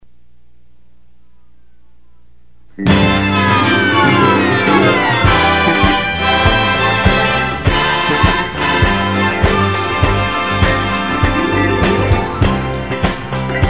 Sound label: (Instrumental)